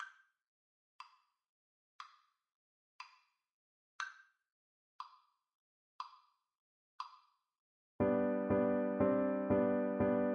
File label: violin; jingle bells